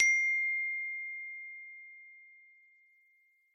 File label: note sms; iphone